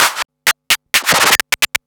Scratch Fills 140BPM

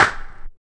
RSS PERC

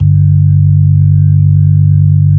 B-3 Club Xfade, Keyboards of The 60's and 70's

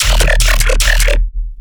bass loop 150 D#; chonker; Virtual Riot

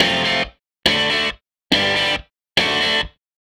Strat Guitar Loop

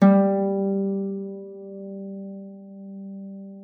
F#3, harp forte; Xsample VOL-8

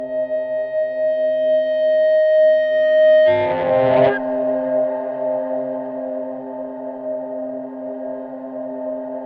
FUZZ CLARIN; Distored Reality

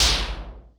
kit, Yamaha Motif-ES8

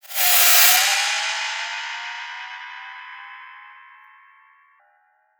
Metal, Percussive FX; musicradar; cartoon caper

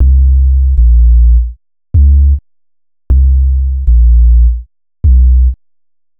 Bass, Futurist Drum & Bass

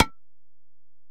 Ac Perc, Drum Machines Alesis DM-PRO

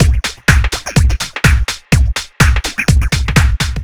125 Blip Jam Fill, Drum Loops; 125bpm; Nu Dance Electro

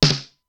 SN, Simmons SDS-9

